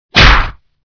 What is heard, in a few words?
punch